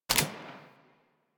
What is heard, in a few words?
Arch Dual Pistol Mech, Weapons; Warframe; Archwing